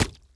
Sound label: footstep jump water